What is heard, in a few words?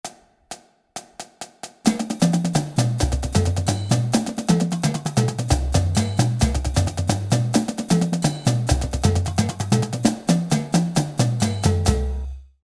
Flams 132, drumline exercise; Chuggeda